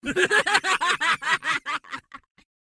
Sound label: attack; Mad Mage; Mob Voice